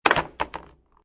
Billiard Pool Snooker Ball Comes In Hard And Falls Into Pocket